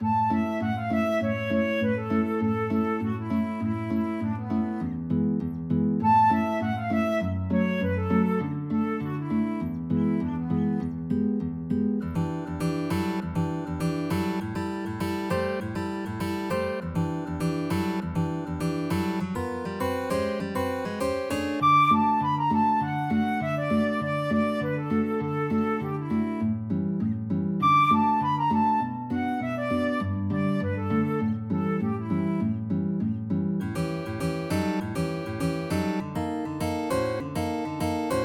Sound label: trio, guitar